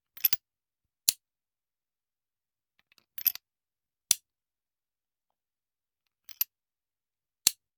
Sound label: Hand Gun Foley; Sound Ideas; Guns